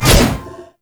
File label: Scythe Spin Whoosh, Weapons; Warframe; Stalker Scythe